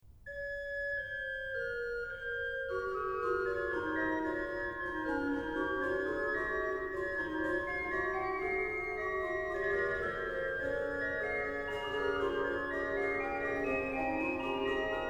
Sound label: Canone alla quinta, ORGAN; BWV769a-2; Canonische Verдnderungen ьber Vom Himmel hoch da komm ich her; BACH; BACH ORGAN WORKS; CHORALE PRELUDES